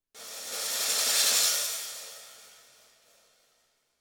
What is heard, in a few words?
STACK BUILD UP, cymbals